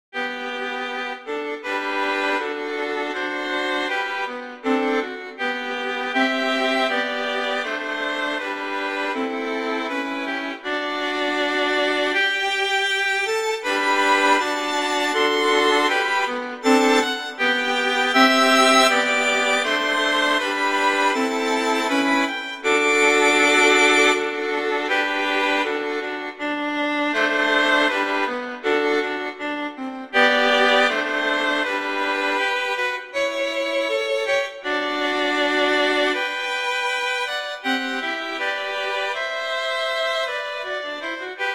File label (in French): Les arpeges - Tema; Wieniawski; Ecole Moderne